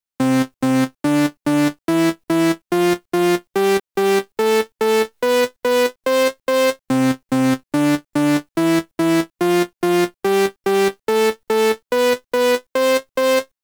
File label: Sound Scale Check; Evil Night OST